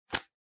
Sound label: Sensu Close